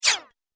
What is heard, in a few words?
Multimedia Rollover, mouseover sound; Business Programs, Apps And Computing Noises